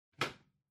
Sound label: Wall Outlet Far 3 Pin Plug In